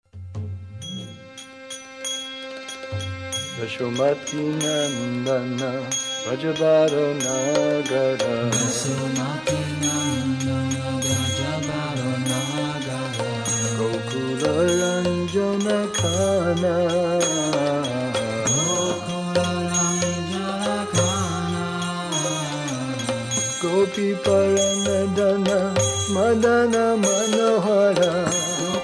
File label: Chanting the Holy Names